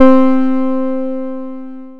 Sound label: piano